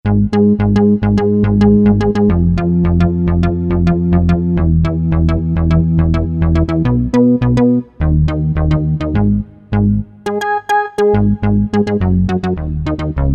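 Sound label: bass Jupiter; Factory Presets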